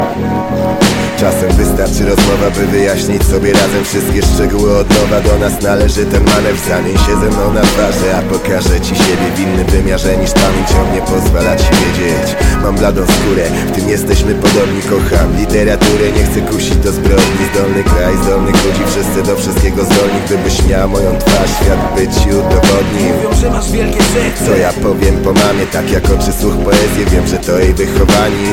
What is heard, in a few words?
ringtone, hip hop